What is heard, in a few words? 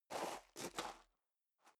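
step high heels gravel medium scuff, footsteps; schritte; menschen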